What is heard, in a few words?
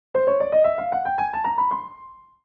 Cartoon ascend piano scale